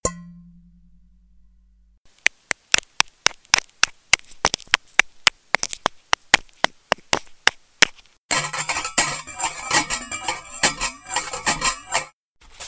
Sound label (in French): cuisine